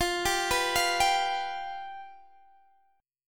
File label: F Suspended 2nd Flat 5th, Guitar, Open D